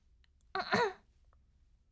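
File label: voice clearing throat; sekibarai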